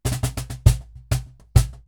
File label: Cajon Fill; musicradar